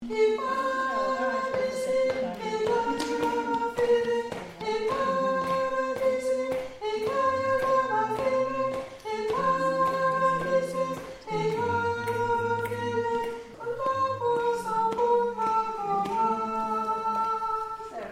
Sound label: il paradisi Ten